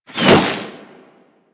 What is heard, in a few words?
Ice Proj Whizzby; Warframe; Corpus; Arachnoid Camper Terra; Abilities